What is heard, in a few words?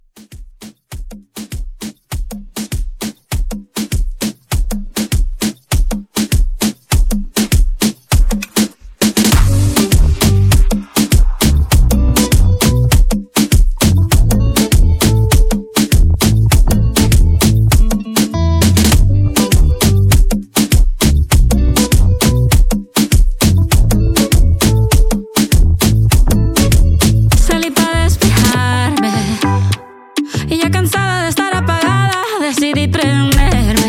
Moomba Re Drum 100